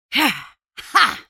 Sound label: spell cast effort, en US; Elise